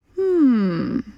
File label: Emote Female Confused; Idle Champions of the Forgotten Realms